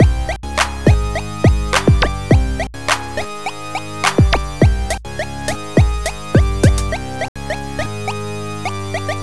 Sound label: beat; GlobalGameJam; Rise Of Blorbs